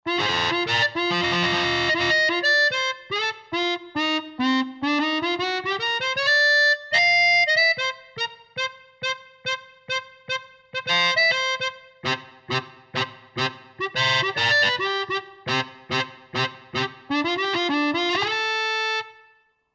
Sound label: Blues Harp, Roland JV-2080